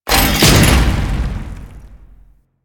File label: Heavy Block Ability End Stomp; Warframe; Duviri; Dax